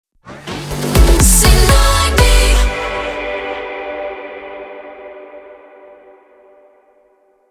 Jingle 122bpm F Rapid Fire

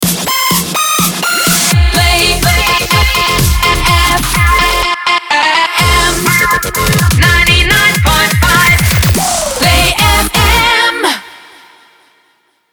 125bpm F min Main ID

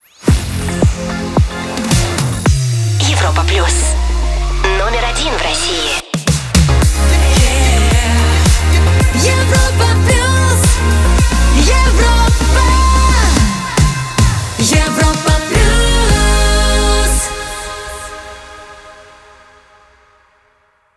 TOTH 110bpm f; Europa Plus 2025

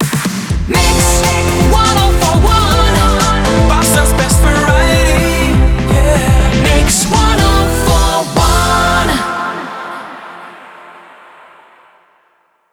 122bpm MIX Main ID; WBMX

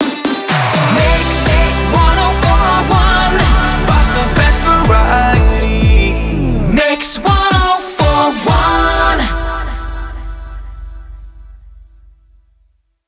124bpm e mix main ID; WBMX 2017

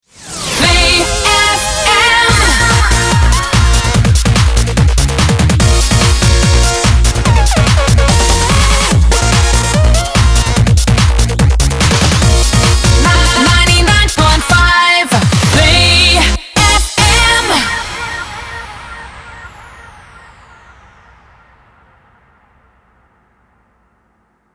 TOH 145bpm Bb min MIX A Full; PlayFM

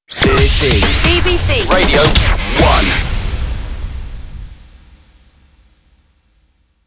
Station ID, jingle; BBC Radio 1

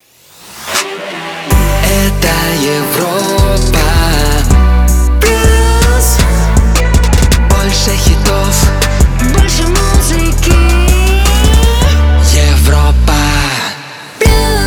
Jingle; Europa Plus 2022

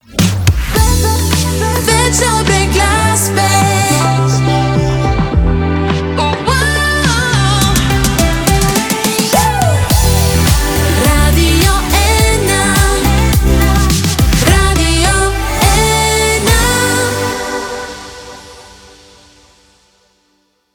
Jingle; Radio 1 Slovenia 2022

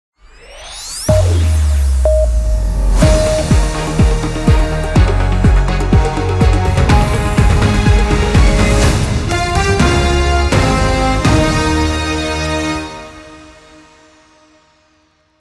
News, jingles; Toti Radio

